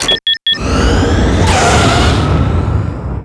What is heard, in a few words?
powerup; svencoop; santas workshop